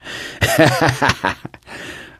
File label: laughter, gambler, survivor voice; source engine; left 4 dead 2